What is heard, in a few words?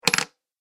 Heavy Coin Dropped On Table; Money Sound Effects